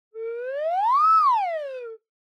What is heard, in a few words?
Slide Whistle Phrase